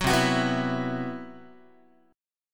C Major 7th Flat 5th down Guitar, chord; CM7b5 strummed; JGuitar

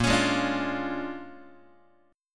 Bb 7th Sharp 9th Bb, Guitar

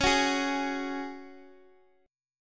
Db Suspended 4th Db

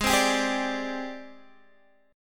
G sharp Major Sharp 11th, Guitar DADGAD; G#M#11 Chord

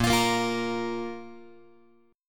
A sharp 5th A sharp Guitar; strummed; JGuitar

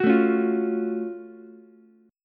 F sharp Minor Major 7th F sharp down Ukulele; strummed

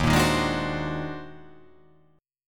D sharp Minor Major 13th D sharp

